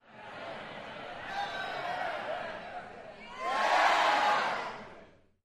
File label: Crowd Int Murmur; medium int